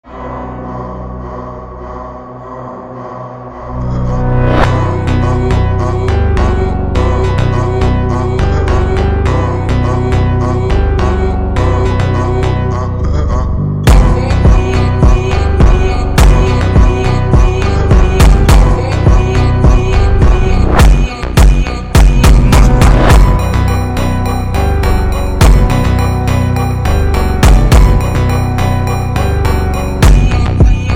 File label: Slowed Reverb